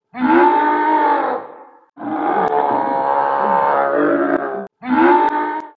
Crazy Monster

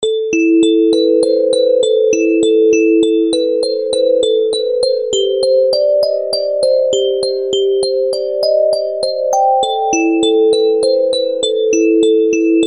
Music Box

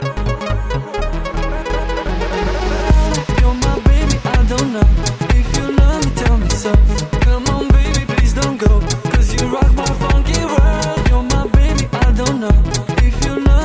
Funky

